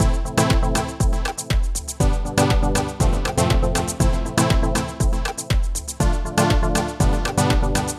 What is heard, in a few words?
Added MIDI Echo